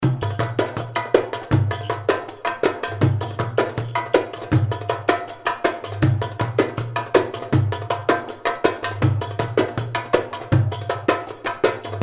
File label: dabkkah; afrique; nordouest